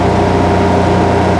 Motor Heavy Machinery; Working Model Program

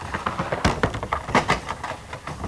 Ball Rolling; Working Model Program

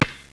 Bounce; Working Model Program

